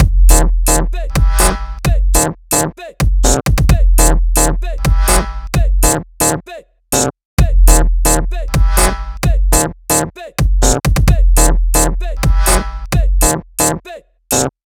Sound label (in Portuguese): BEAT PASSINHO; DJ JhonBeat